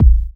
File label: Bassdrum Clean; Best Service; House Definition VOL-1